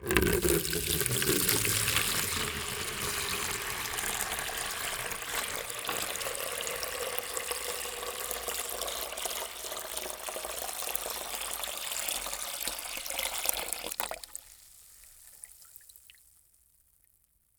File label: Liquid Water Pouring